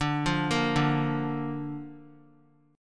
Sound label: D Minor Sharp 5th D Guitar; arpeggiated; chordshape